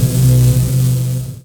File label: gunslinger draw; holo